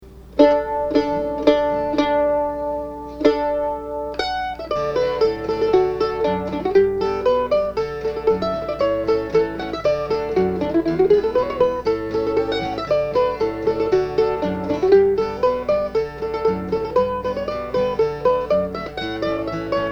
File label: Hornpipe; Prescott